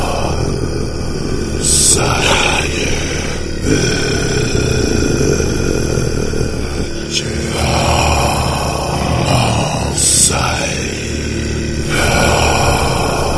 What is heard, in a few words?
vort chant loop; video games; ambient; source engine; portal2; outland